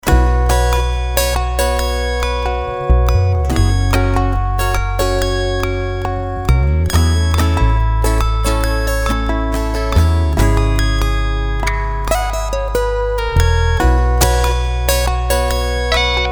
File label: softly steel